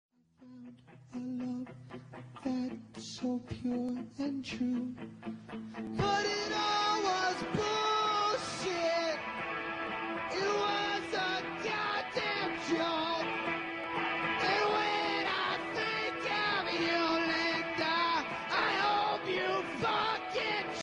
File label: electro ringtone